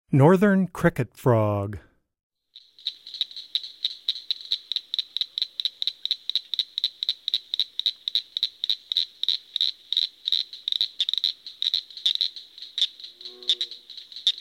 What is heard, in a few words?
Northern Cricket Frog